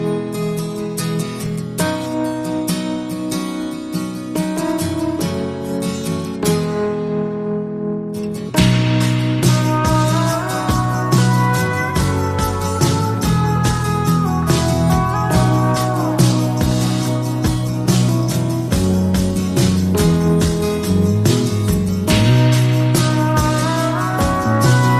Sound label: acoustic guitar